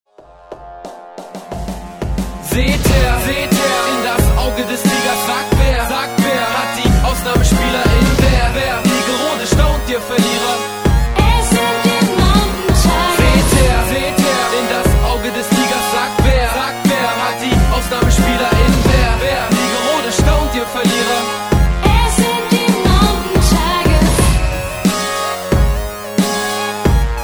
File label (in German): Anthem klingelton